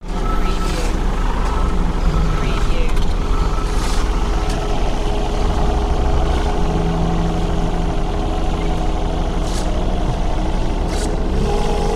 Road Crew

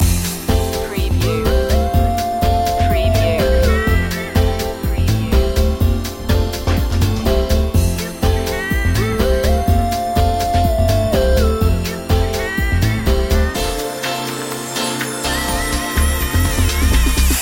vintage beats loop

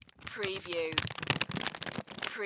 Bag Plastic Handle; sound effects bible

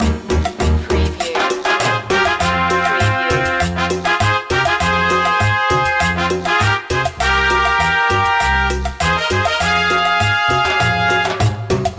colombia cumbia loop

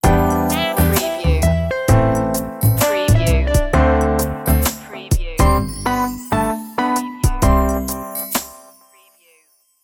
sand and sun stinger

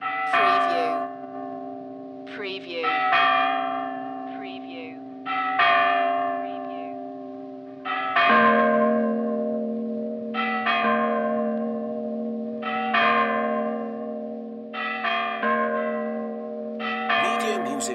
Church Bells Joyous Fast

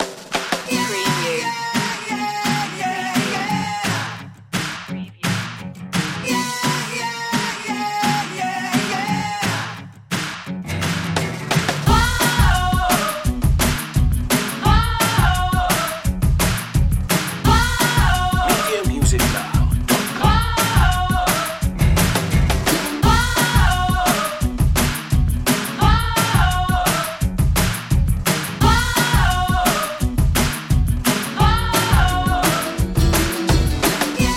vibrant voices 172bpm

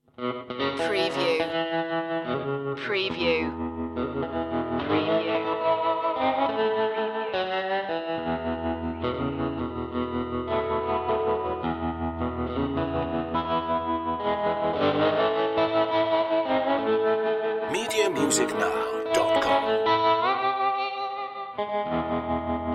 jackdaw blues

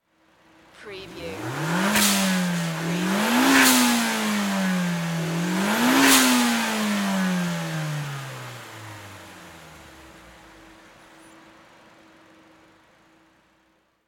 NISSAN SILVIA ENGINE TRIPLE REV

